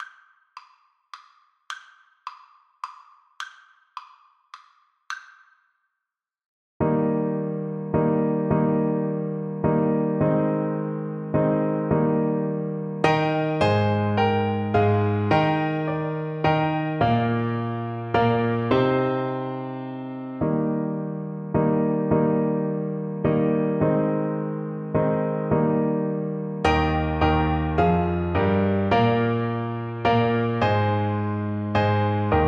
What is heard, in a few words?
arthur mcbride, Trumpet